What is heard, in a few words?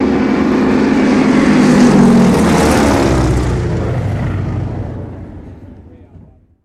Japanese Zero Fly By, ww2 fighters